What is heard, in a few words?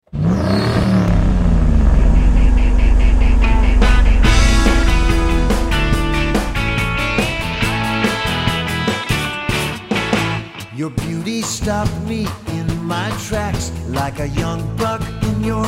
mix w truck noises